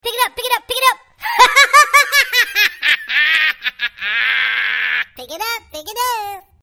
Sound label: Crazy Laughing Pick It Up